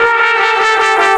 Trombone Effect, effected trombone

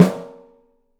OPEN STEEL SN; 3D Drums

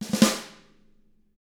snare ruff; ILIO; Double Platinum Drums 1; SNARE ROLLS

